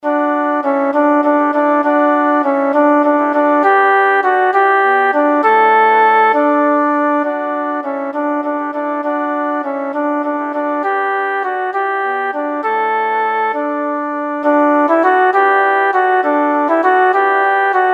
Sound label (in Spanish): Unidos en el canto VOZ